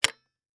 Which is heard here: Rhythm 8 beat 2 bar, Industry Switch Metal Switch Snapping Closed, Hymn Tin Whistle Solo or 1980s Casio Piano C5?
Industry Switch Metal Switch Snapping Closed